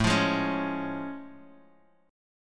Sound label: A Augmented Major 7th A; strummed; JGuitar